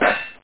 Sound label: clank; apogee